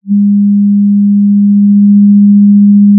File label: pop, Sound of a cruise ship horn